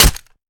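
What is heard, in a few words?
melee character gun small steel; viper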